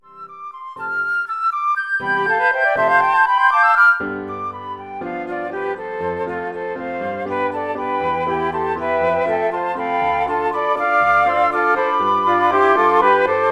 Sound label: flute quartet + PA